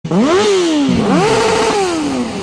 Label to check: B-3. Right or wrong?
Wrong